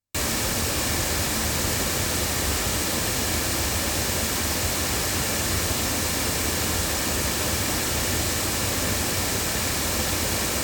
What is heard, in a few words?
loudspeakers; JJLabs C6000 Pro; microphones